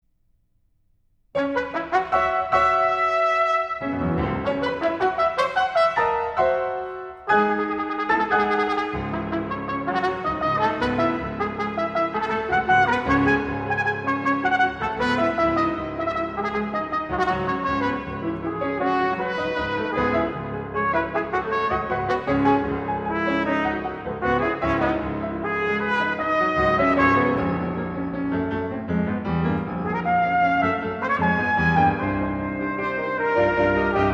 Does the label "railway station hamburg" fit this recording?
No